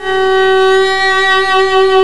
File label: violin; Groovy Bytes: Behind the Moon